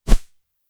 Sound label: the sound of a synthetic, cartoony